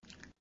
Suck; Lewd SFX

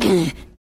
Moan

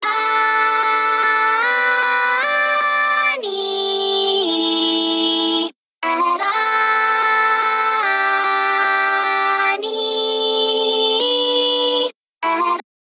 Vox Layer

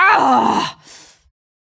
Grunt; Lewd SFX

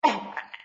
Gag; Lewd SFX

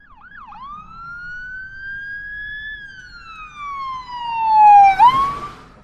Police Siren; Alarms , Sirens, and Horns

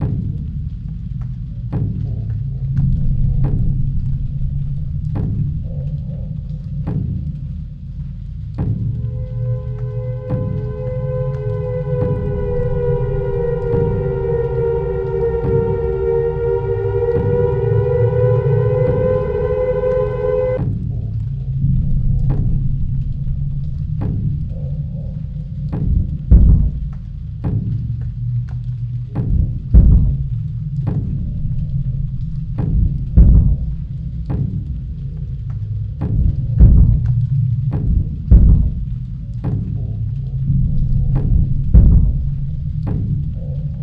lost ambience; KeybladeMasterXCross

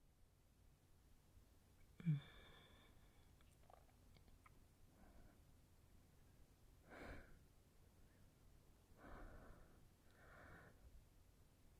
[F] [Damsel-out-of-Distress Noises], [Mic Noi; My Hero, You Saved Me!; 5 word challenge; Furniture Noises

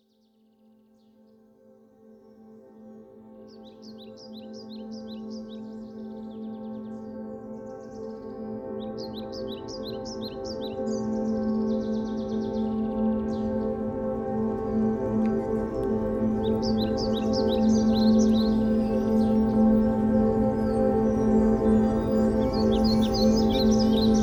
Soundscape; A Canterlot Soundscape

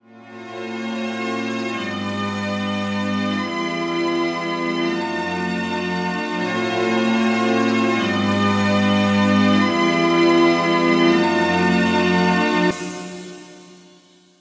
strings; Pony Fan Music Remix Stems

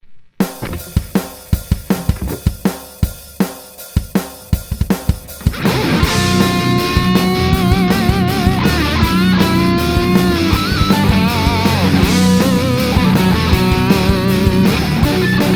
Metal, B-Minor solo

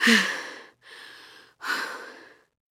Pant; Lewd SFX

